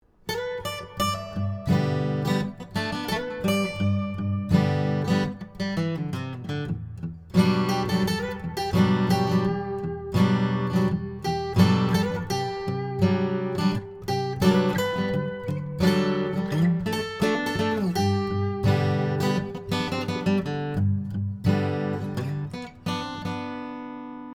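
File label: G acoustic guitar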